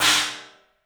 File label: CYMBALS; 3D Sound; 3D Drums